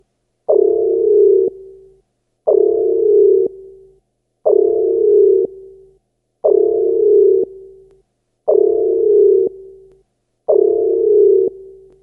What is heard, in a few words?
blue alert